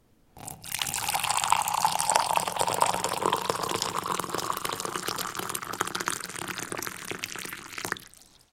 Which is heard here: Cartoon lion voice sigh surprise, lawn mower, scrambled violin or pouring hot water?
pouring hot water